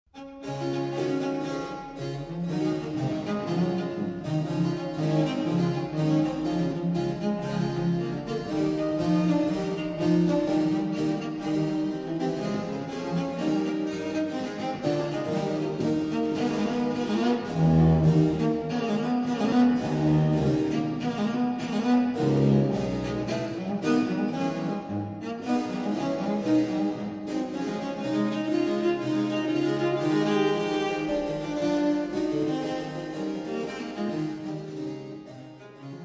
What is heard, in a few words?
pipa